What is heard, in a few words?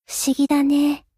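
attack; hina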